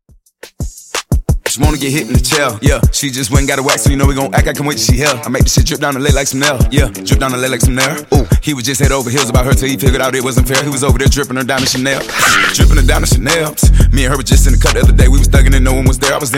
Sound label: Funk Intro Outro Clean Dirty 88Bpm